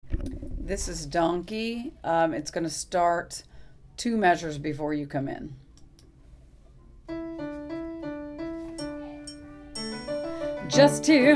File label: donkey music example